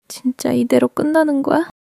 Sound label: crying